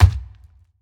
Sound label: step; alien